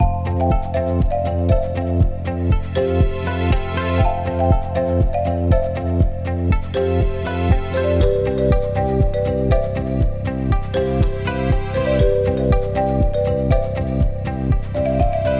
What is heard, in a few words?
Corporate ad music marimba; variation